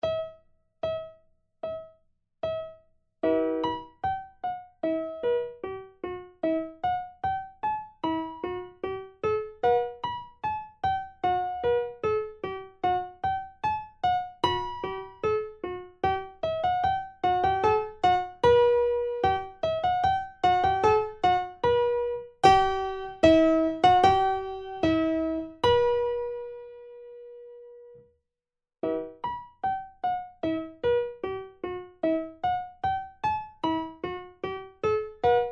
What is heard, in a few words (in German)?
Primo, Vierhaendige Uebungsstuecke; Playbacks; Diabelli